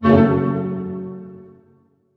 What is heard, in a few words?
HARP HITS F# MN, Orchestra; Sound On Sound - Peter Siedleczek's Orchestra